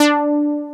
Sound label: OB8 SEQU, SYNTH; East West - Global Sounds; USA SYNTH